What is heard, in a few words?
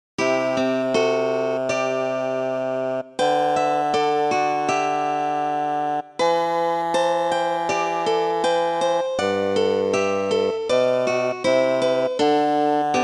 MOS scale10